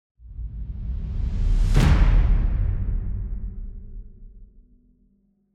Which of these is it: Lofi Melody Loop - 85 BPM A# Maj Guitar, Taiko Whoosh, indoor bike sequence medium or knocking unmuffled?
Taiko Whoosh